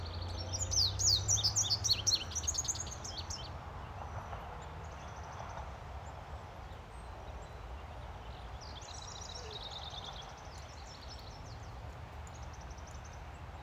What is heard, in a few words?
indigo bunting